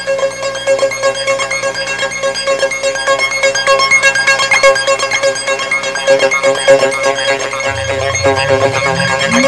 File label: SQUEAKY